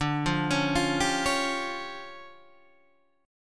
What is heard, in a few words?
D Minor Major 7th Double Flat 5th, D, Guitar Standard; DmM7bb5; arpeggiated; JGuitar